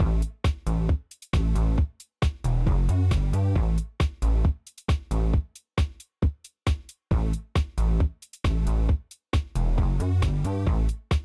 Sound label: Pause Loop; Tetris; PlayStation 3